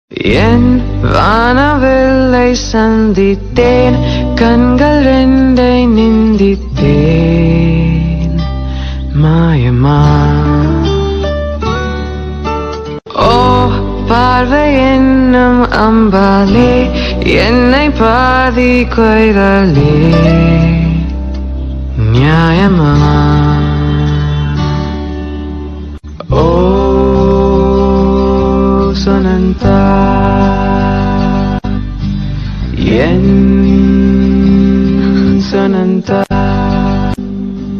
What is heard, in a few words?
ringtone bgm